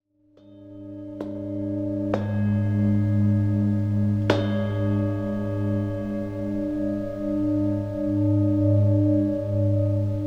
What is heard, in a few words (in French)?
Chant d Aurus, bols tibetains